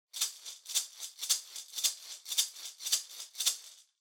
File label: Maracas Pattern